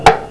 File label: a hit; iNNiNG